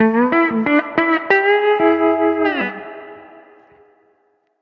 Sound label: complete sting